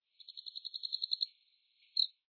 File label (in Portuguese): mariquita